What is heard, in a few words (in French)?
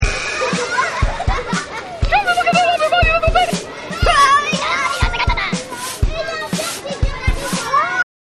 gardien balai musee son musique fete chut ambiance